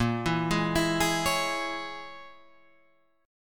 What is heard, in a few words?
A sharp Minor 7th Flat 5th A sharp, Guitar Half Step Down